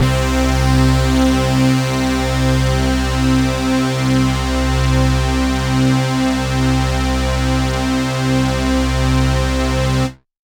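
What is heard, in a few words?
STR C2, Roland MKS-80 synth strings; Pads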